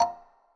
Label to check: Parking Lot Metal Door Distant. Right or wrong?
Wrong